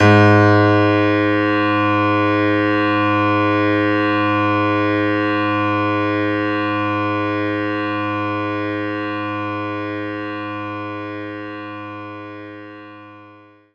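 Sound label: Grand Piano G sharp 2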